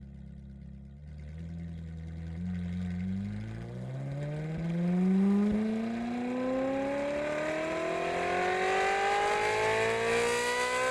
Ferrari 458 Straight Pipes - ONBRD - RAMPS - Drive Stop Off - EXHAUST - Left; 2013; DPA4062; Pole Position